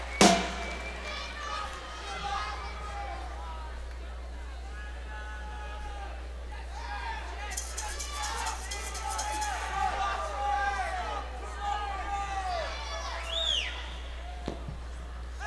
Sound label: Tuning-Breather